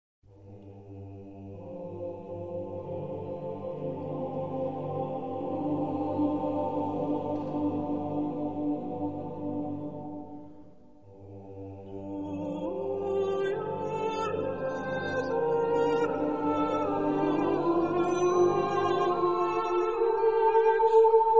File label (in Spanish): coro